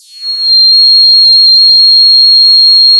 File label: pop, sound of a tea kettle whistling